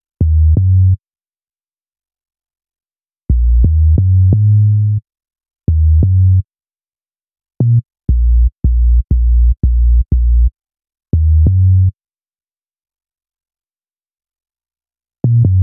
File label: Bass, Hip-Hop